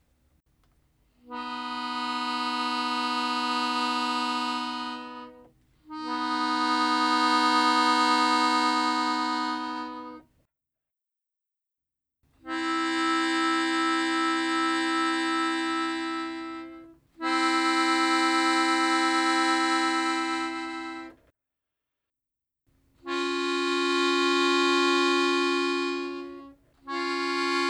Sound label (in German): Durterzen, akkordeon